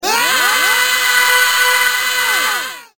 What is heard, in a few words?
aaaaah